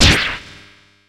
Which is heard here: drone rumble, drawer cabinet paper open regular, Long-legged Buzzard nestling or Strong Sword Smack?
Strong Sword Smack